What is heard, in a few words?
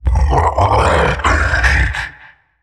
onslaught channel; weapons; dota; primal beast